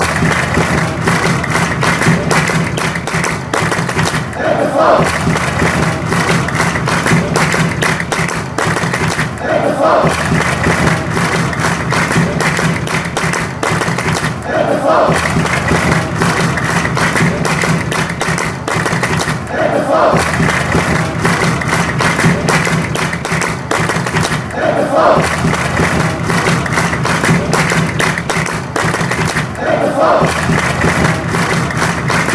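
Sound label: clap fsv, football songs and soccer chants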